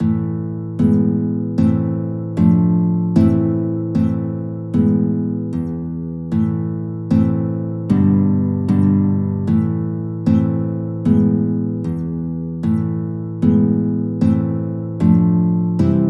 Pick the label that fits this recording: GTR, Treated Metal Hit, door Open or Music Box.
GTR